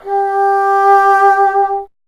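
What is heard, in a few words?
wind bassoon G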